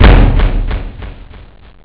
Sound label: tom tom; Amiga Soundtracker sample